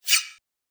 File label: Exchange Cards sound fx narrow; Rizline